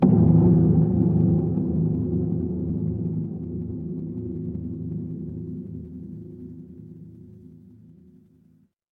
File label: horror ambience dark